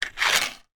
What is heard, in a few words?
office paper clips tip out of box onto table